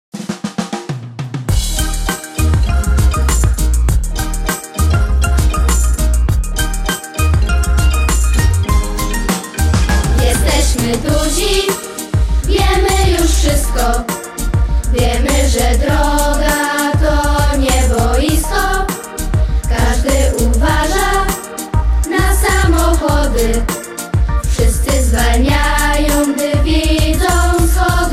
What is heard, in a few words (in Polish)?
inst.